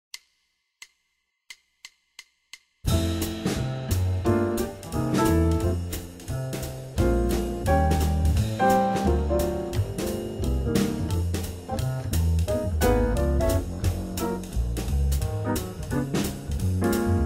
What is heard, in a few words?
audition for horns